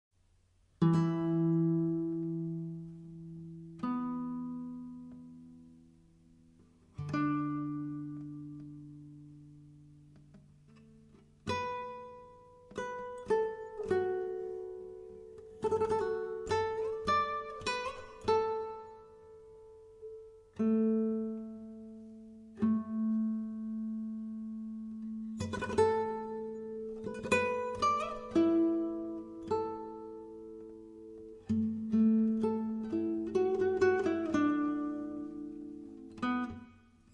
Great Waves Sweeping Away Sand, Traditional Chinese Music for Guitar